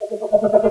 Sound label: health 8Bit; abbottabad compound